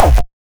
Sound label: kikk, kick